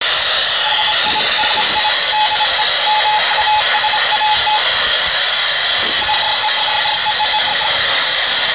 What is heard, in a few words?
microsat; musat-1